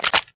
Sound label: mp5 slide back; weapons